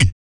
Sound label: VOC PERC, Vocal Planet Jazz FX BASS PERC